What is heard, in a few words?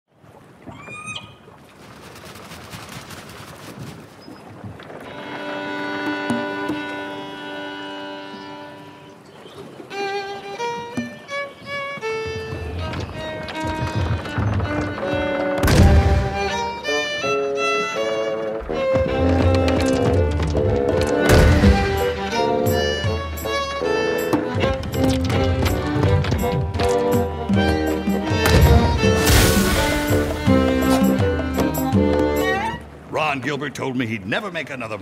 Announcement Trailer Score